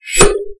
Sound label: knife; wesnoth